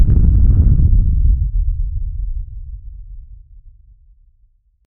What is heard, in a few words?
a deep muffled undergroun, underground